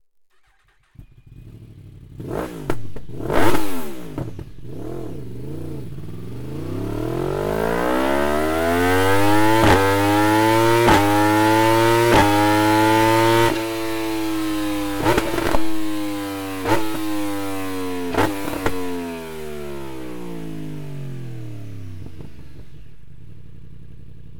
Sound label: slip on with opt link pipe; akrapovic